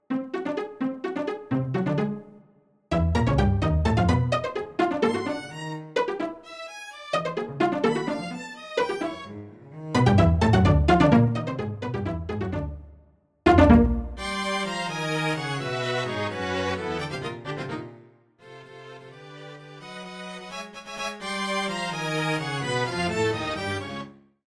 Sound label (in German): Streichquartett